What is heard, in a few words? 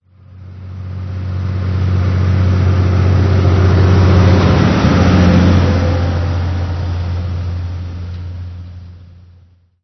Truck; 暴力赛车